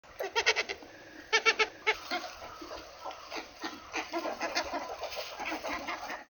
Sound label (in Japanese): kurotsura herasagi; 漫湖水鳥・湿地センター; おうちミュージアム